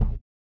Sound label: GLASS; Spectrasonics; Metamorphosis; GLASSBABY